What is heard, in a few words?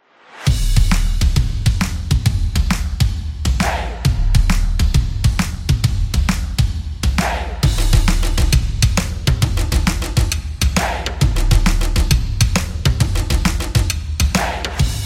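Sport Hype, Football percussion background music inspired by samba rhythm, stadium energy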